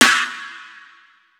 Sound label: Snare, Dubstep Snares; GhostHack